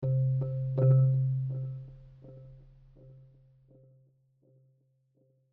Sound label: Marimba and Drums Mood; gamerip; the last of us